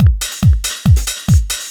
DRUM, HOUS-TEC DRUMS; MAGIX

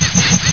scratch

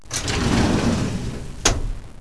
FILING

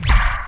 FIREBAL; THEXDER

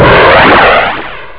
Missile; marathon

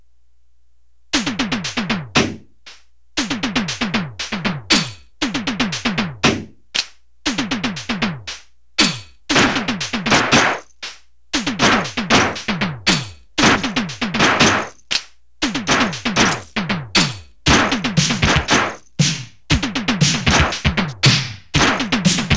PINBALL

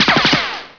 LASER; ARMRCMD

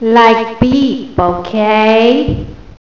like beep

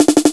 DRUM BAM

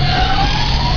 SWISH; ABUSE

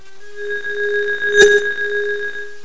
ENERGIES

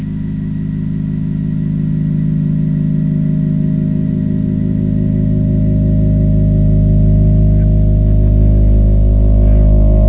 SITAR

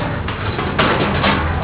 garbage, cans; EFFECTS

